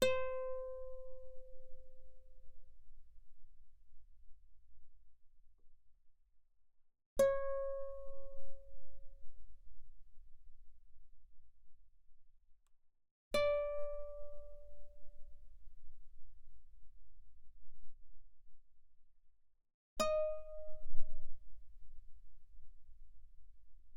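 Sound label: Guitar mezzo forte sul B C5 Gb5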